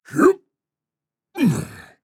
Grunt Jump; Paladins; Shaman

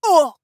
Death; Imani

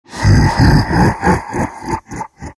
shards laugh; Terrorblade

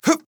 Grunt Jump; Corvus; Paladins

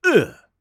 Grunt Fast; Assassin; Six-Shot Cyborg; Realm Royale

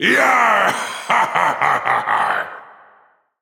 Laugh; Makoa

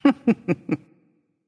laugh; Iron Conqueror Hera; Smite

